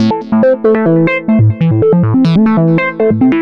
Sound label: Synth Soul